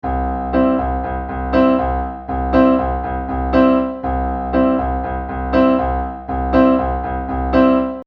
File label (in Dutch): pop groovje